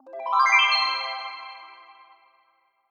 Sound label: win; puzzleweave